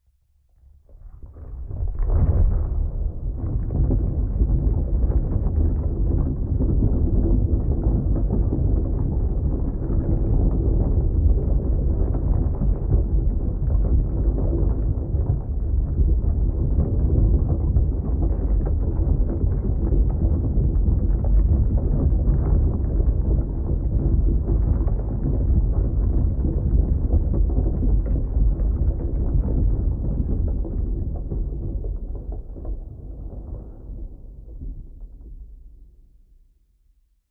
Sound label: Block Rolling